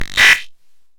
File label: glitched tones Feedback FX